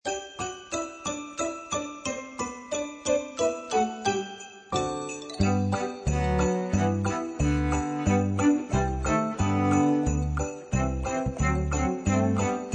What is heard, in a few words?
jingle bells